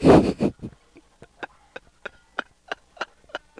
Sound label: Unvoiced snort like and then cackle male